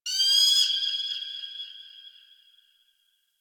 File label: Forest Bird High; Warframe; Grineer Forest